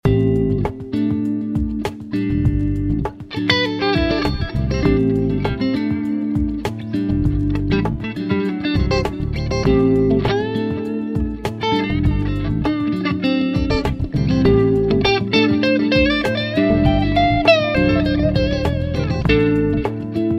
Mercury EL34 Stratocaster

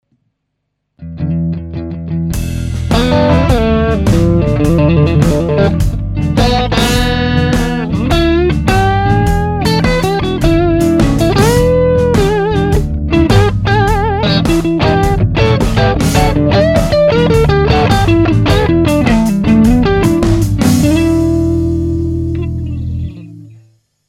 Boost

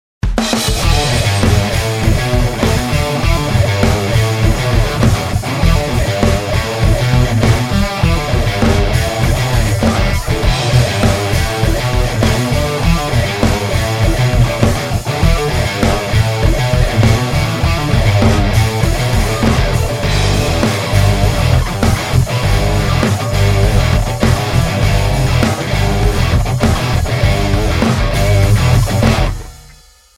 Boost

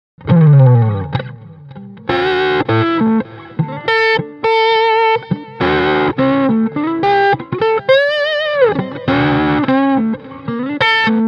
PRS Crunch; Mercury Box

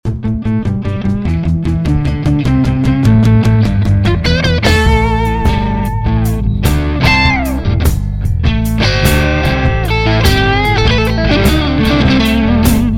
Wizard 6V6 Stratocaster

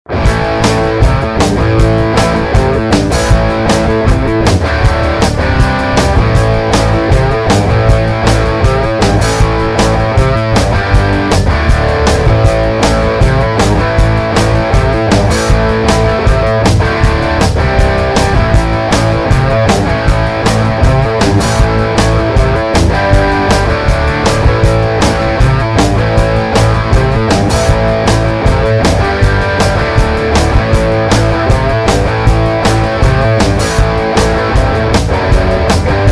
Les Paul Crunch; Maranello

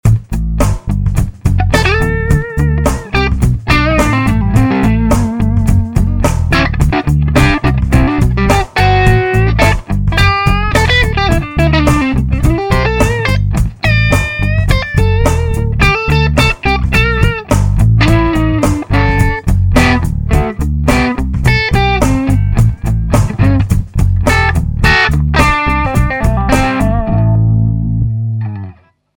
Stratocaster Blues; Maranello

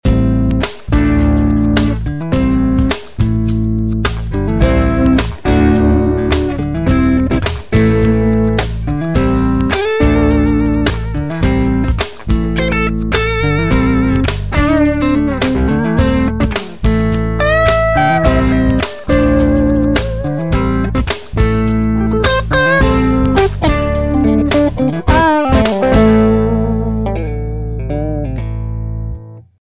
Stratocaster Dirty; Maranello

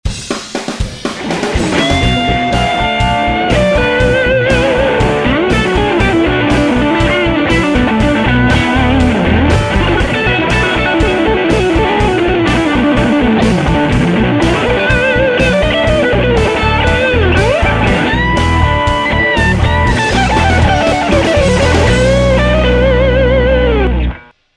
DeVille Lead; Overtone2

